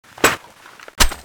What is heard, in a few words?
clipin; weapons